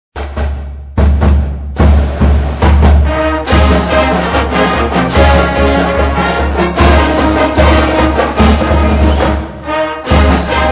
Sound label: Fight Song; Wake Forest Demon Deacons; Heres To Wake Forest; NCAAF Football